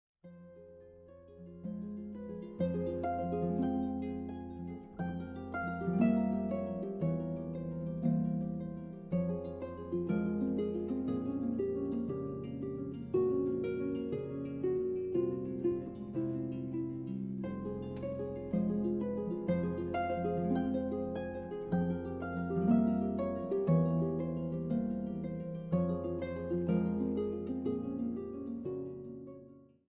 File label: Harp; Bochsa; 19e Étude